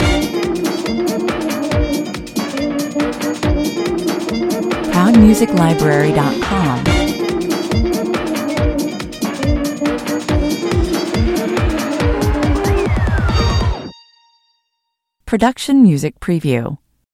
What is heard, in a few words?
shuffle stinger